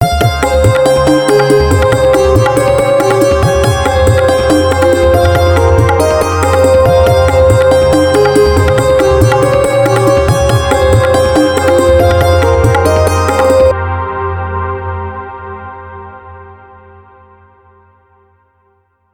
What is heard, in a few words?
santoor indian tabla